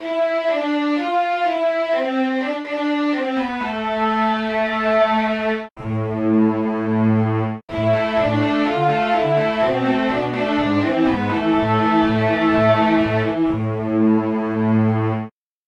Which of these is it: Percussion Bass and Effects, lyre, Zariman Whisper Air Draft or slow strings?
slow strings